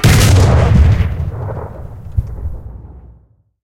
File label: artillery outgoing, cannons; Civ, Weapons, Explosives